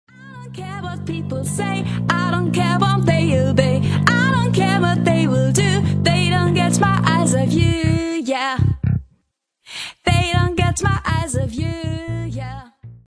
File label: rock beat